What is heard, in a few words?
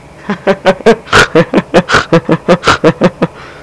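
laughters; People; Sound Library 2000; Wayzata Technology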